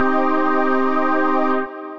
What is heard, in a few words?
Major Award Stab; Synth Keys; Ableton; Glitch and Wash